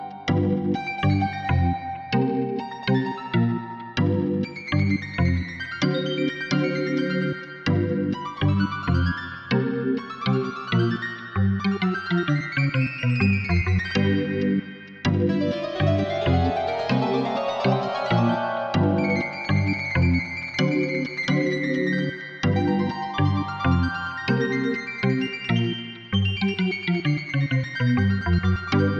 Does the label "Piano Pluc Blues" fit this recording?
Yes